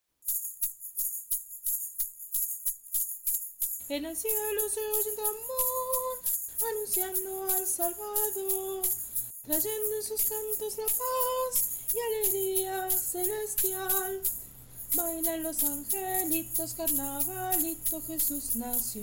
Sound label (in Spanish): Shacker y voz. Carnavalito. Tutti